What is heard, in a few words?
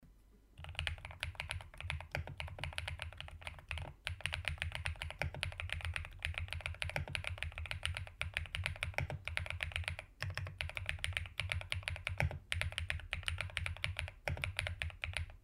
kiiboom; kiiboom breeze 75